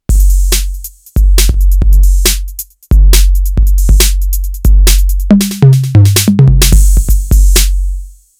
Roland TD-4KP

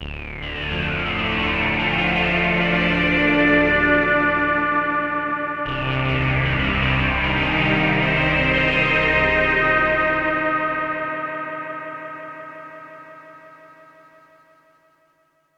AMS dmx 15 80S Obi delay

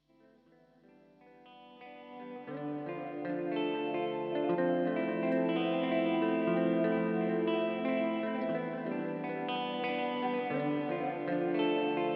Delay Reverb; death by audio total sonic annihilation

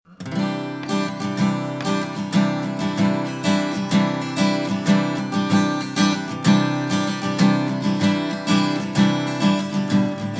Harley Benton D-120 CE Strumming Mike

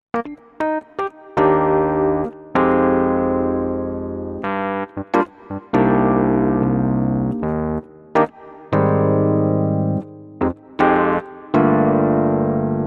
Ketron SD 90 Rhodes

